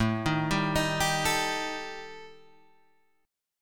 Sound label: D sharp Major Flat 5th, A